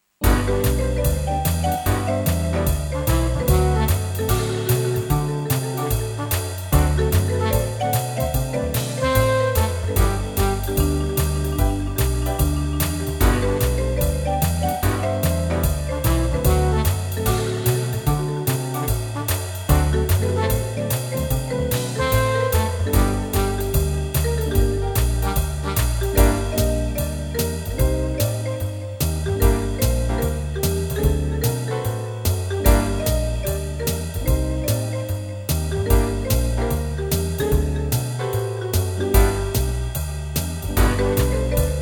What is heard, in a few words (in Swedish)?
Mjuk Marimba Swing